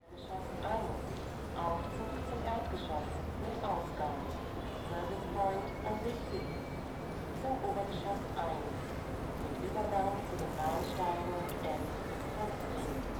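Hauptbahnhof station hall close to elevator underground level distant trains incoming, Berlin; Creative Audio Pool; URBAN BERLIN VOL. 1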